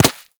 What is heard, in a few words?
Laser Impact Generic; Warframe